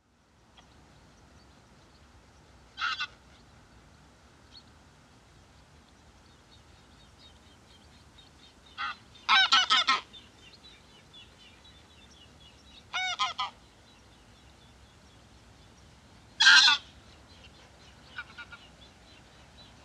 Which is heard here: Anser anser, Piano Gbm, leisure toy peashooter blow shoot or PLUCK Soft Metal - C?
Anser anser